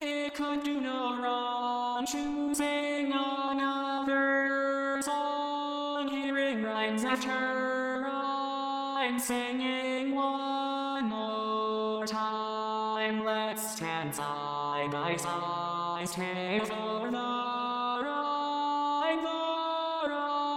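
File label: Baritone, barbershop tags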